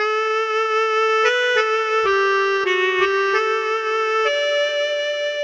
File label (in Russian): klarnet